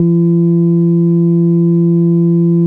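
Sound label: Yamaha CS80 F2 3; ESOTERICANLG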